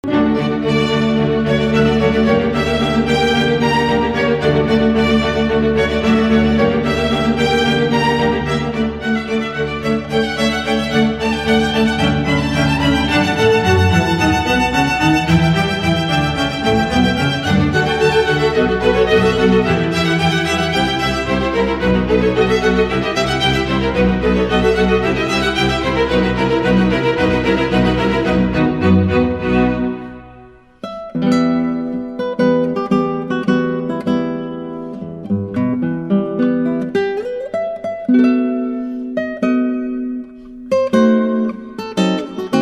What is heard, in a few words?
Chitarra Concertante, Gitarrenkonzert; Carulli